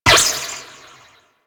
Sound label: Ricochet; Warframe; Cowgirl